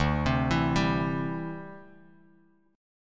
D 7th Suspended 2nd Suspended 4th D, Bass Standard; D7sus2sus4 arpeggiated